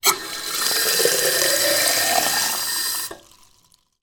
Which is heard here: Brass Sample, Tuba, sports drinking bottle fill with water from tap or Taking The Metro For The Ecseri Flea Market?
sports drinking bottle fill with water from tap